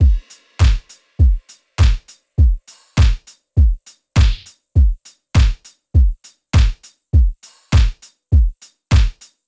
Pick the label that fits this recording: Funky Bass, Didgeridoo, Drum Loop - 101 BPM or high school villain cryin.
Drum Loop - 101 BPM